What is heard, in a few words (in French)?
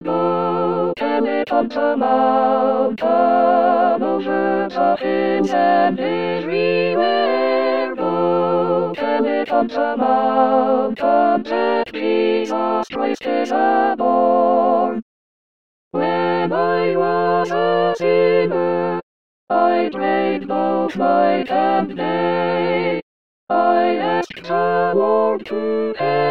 Go tell it on the mountain Tous